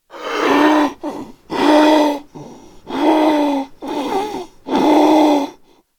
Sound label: idle, zombie; DayZ-Epoch; dayz sfx